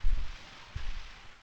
face crawl

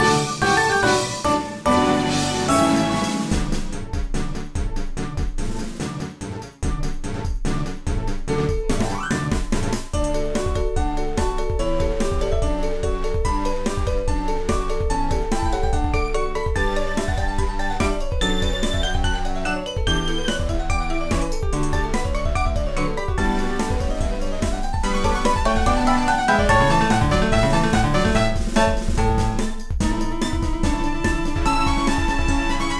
Taiko Bgm; nodame cantabile

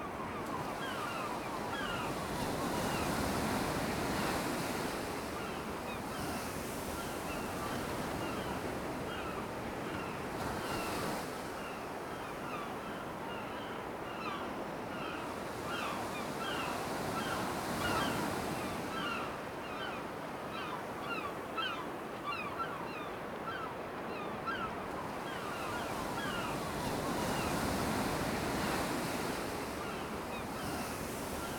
SFX TENNIS EVE; The 39 Steps

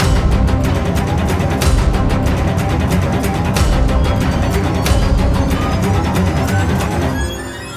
Combat

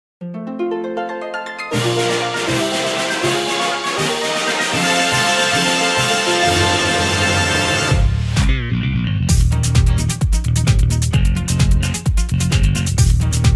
Jingles RPG Music Victory

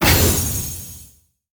Land Synchro Middle; Se Land Synchro Middle

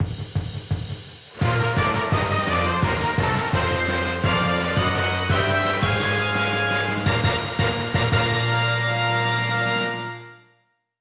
Ouen Brassband

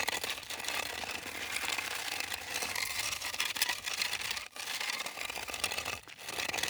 masonry slide loop; teardown; ps5 windows xbox series xs

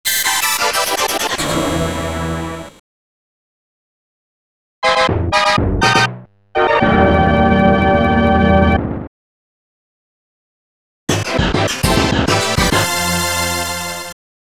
Jingle Collection; the journey home quest for the throne

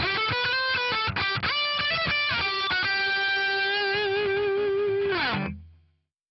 EVENT SE GUITER; Tales of the Rays

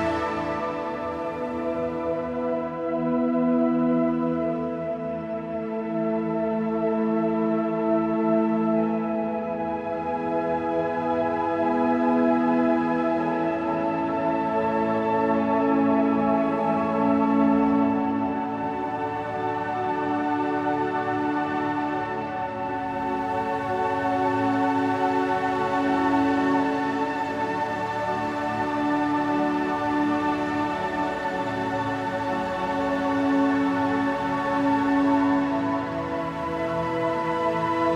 Loop Sad only 110bpm; jenny leclue detectiv

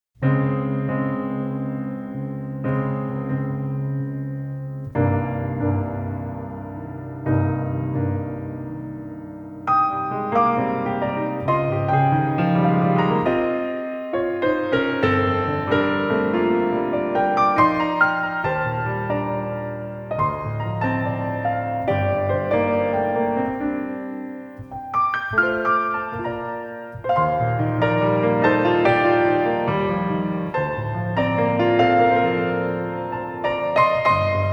Calm; Extreme Molester Terminus

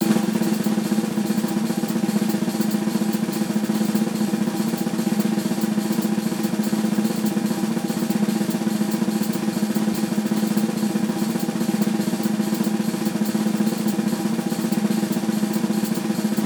Mvp Roll; Everybody 1-2-Switch!

